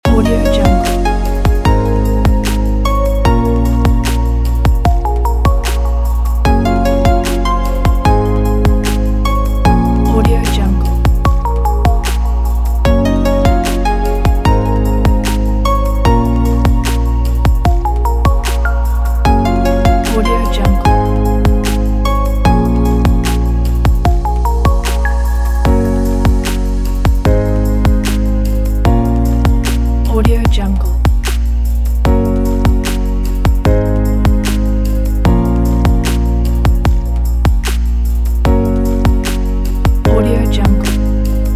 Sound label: background promo loop